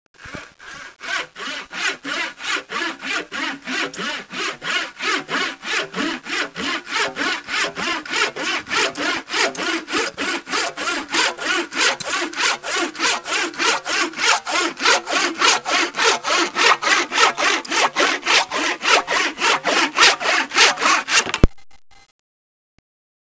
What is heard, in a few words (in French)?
scie